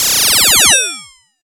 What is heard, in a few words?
laser; pyntnclick